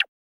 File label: Perc; bedroom baddies